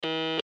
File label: Morse Code Beep, Morse Code Beep Long; Blastwave